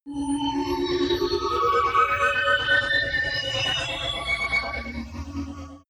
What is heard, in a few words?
sci-fi mysterious galaxy beauty; horror